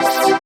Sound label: HOUSE, House Dance